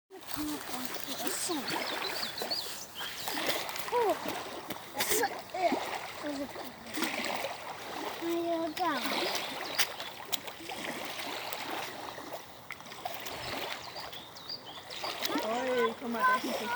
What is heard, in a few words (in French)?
aquatic enfants vagues